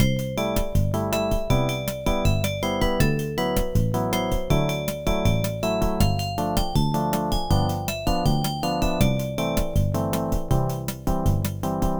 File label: BOSSA; AdLib music synthesizer card demo songs; IBM PC XT AT